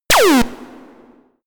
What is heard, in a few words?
buzzy lasergun; krieg-waffen; soundpool